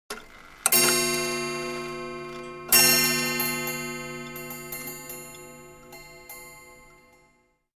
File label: Clock 8 Strikes, mantel